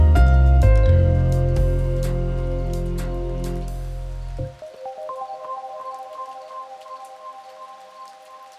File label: Doo Pad; BB 2HTC Samples Vol 4; Pads